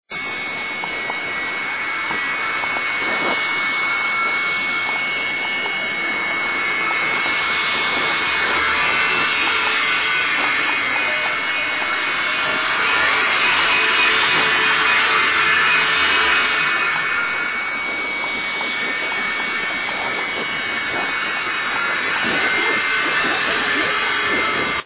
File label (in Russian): hrust